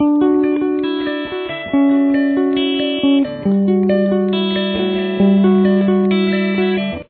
This riff is set at 149 bpms (beats per minute) and is a little tricky.; You may want to fingerpick this riff.